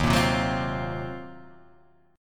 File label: Eb Minor 6th Add 9th, Ebm6add9 chord; strummed